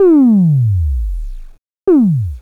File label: Drums, Hits; DCM8